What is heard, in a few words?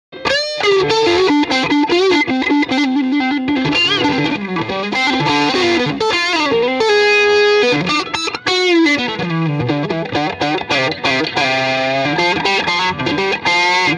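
m75 tele jtm45 solo neck pickup distortion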